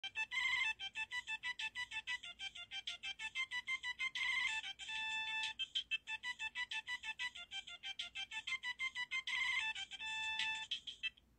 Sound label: 3310 Dudu